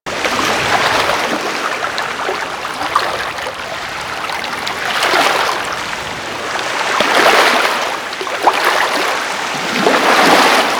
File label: Water Lap Rock Small; Ambience; Warframe